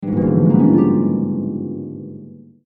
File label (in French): Harpe